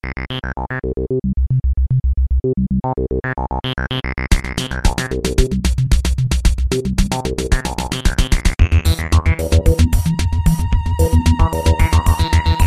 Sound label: RINGTONE